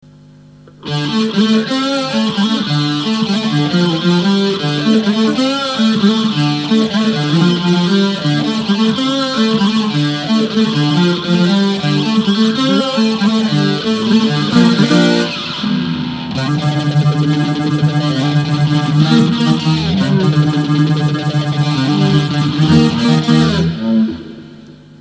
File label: Synthe String